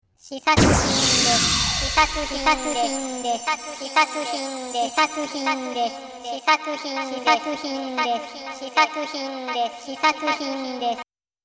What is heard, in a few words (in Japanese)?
効果音